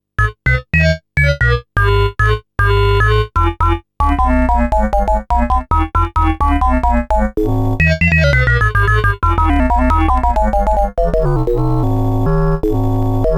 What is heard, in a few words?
Pingy